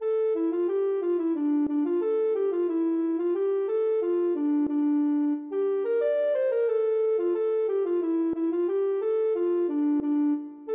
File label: ocarina